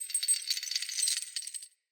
Chain Creak; UnderCity

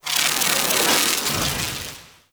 Hunter Sniper Shot Projectile By

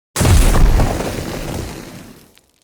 Drunken Flame Pillar Burst; Enemy; Abilities; Characters; Circade

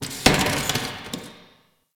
Derelict Metal Creak Small; Interior; Ambience; Warframe; Orokin Derelict

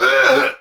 Pain; Warframe; Tau; Dax; Ashigaru

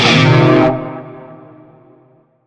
Shawzin Blues Chord; Warframe; Narmer Shawzin

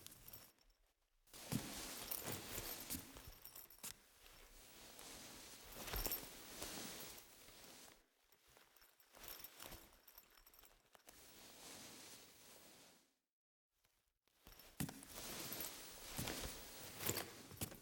Foley; Cinematics; Warsongs; Warsong Prologue

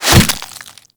Barb Hit Flesh; Warframe; Desert Skate; Hit Effects

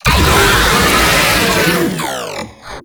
Pain, Vocals; Warframe; Proto Infested; Babau

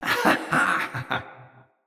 Laugh, Battle, Barks, Dialog; Mendicant Wazard

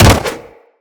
Jump Attack; Footsteps; Wall Run; Characters; Tenno

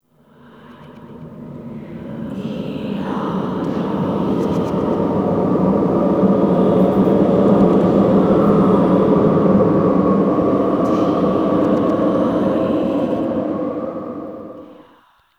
ghost wind; Ambience; Dagath; Warframe; Clan Dojo

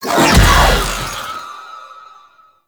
Whirlwind Cast Start; Warframe; Caliban

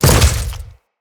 Step Goo, Footsteps; Enemies; Grineer

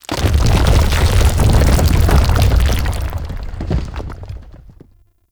Rock Sink; Gameplay; golden maw; Warframe; The War Within

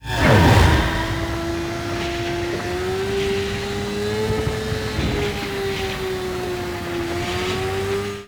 convoy close up; Rusalka; Warframe; NineteenNinetyNine; Stage8ShitboxConvoy

